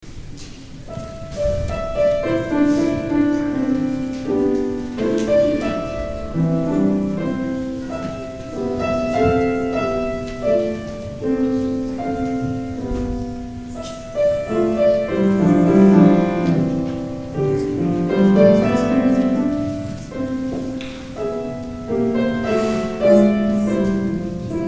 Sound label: Prelude